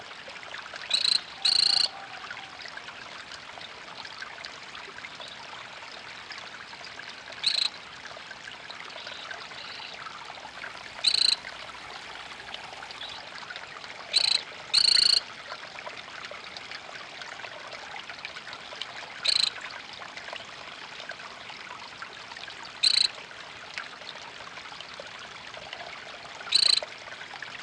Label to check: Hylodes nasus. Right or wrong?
Right